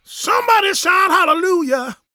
gospel male, vocal; ILIO; Vocal Planet

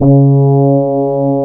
C#, Synclav FM, BRASS; Big Fish Audio

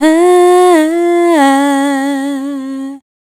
Dance Vocals, FEMALE

